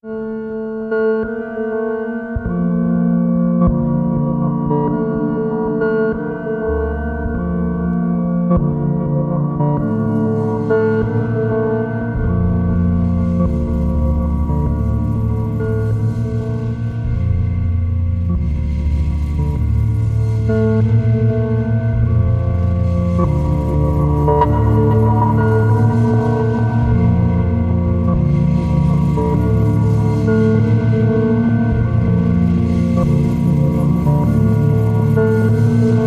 Ambient

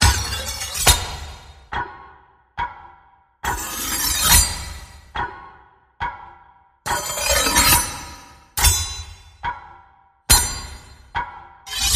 Carving Knives Rhythm

